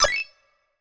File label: button